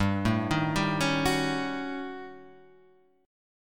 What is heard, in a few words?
Eb 7th Sharp 9th Flat 5th, G